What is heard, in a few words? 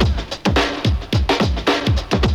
BREAK; Zero G - Man Machine